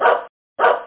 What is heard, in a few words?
bark; a filofax pro